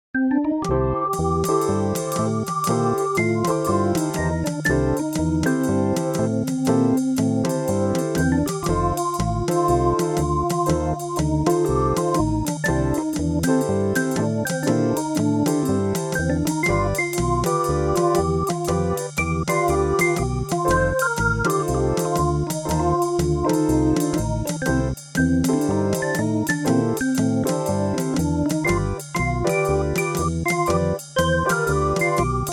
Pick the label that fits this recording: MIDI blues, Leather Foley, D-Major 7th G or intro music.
MIDI blues